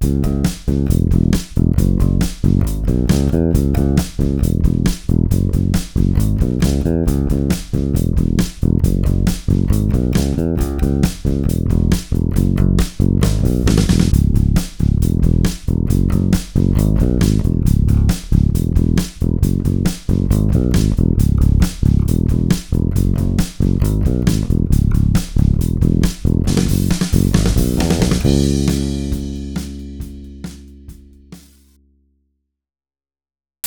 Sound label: rock